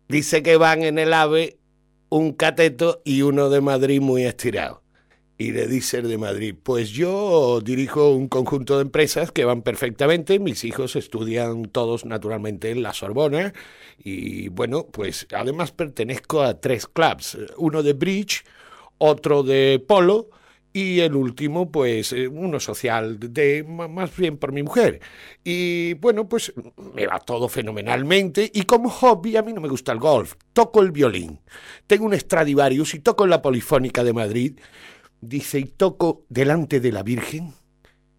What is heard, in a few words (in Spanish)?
El violin